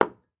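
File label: sounds of hairspray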